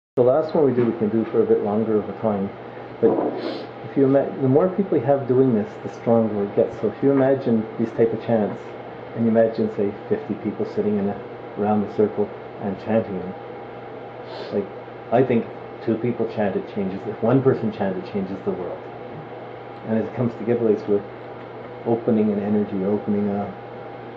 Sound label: Silence in Chanting